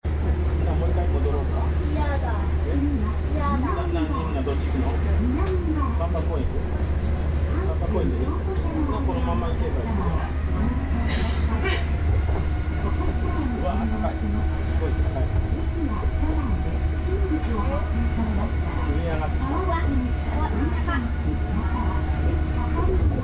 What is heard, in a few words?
monorail; japan